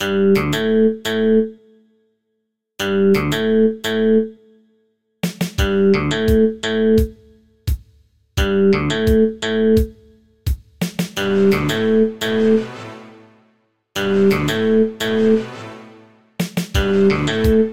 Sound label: ringtone rebound